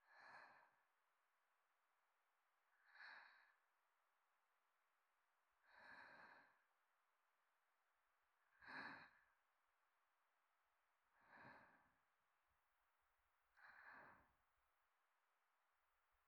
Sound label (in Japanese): 寝息ループ